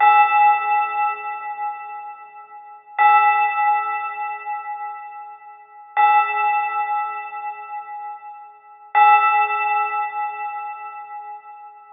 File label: 161 BPM C# Min Bell; Trap; Loop Stems; Cymatics; Cadet; 2022 Melody Collection